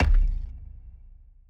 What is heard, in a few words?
Super Heavy Object; TANK; 3Souls